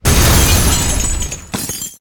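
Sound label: capsule undead golem death, monster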